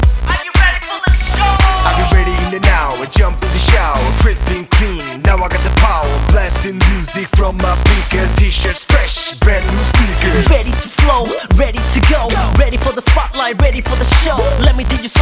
rap

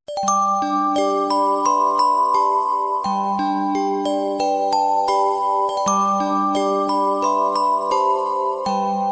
very nice bells

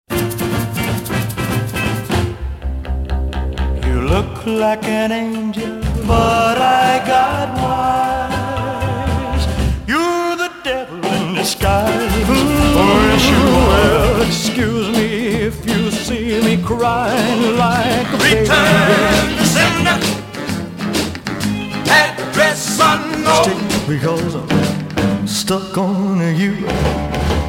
country mix

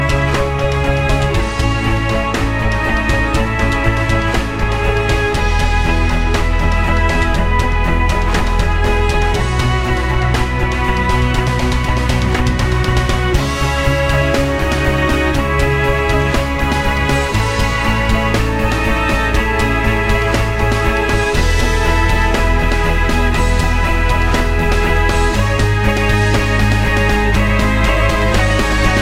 electric cello; ringtone

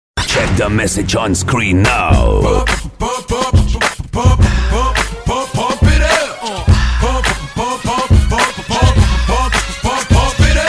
new msg screen, ringtone

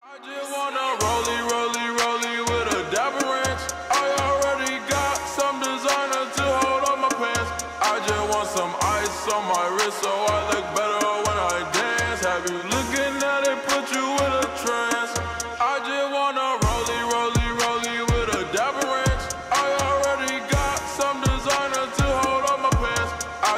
slowed reverb

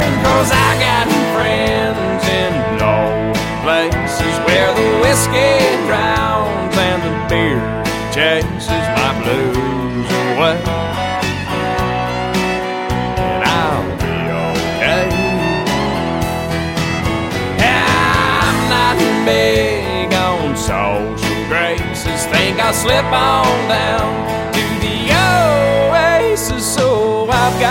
country

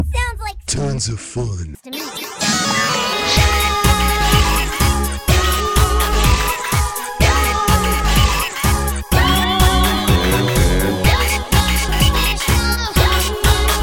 psi remix

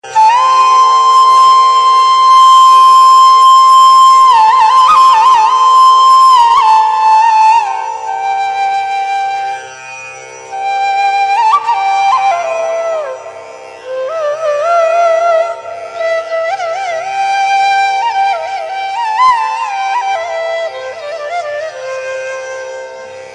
flute classical

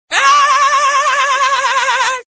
laugh; spongebob

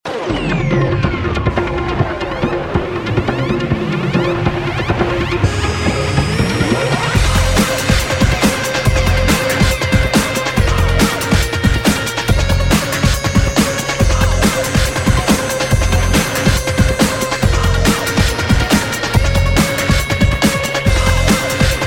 mix electronica

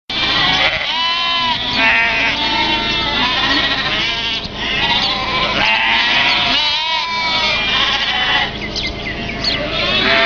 more sheep noise

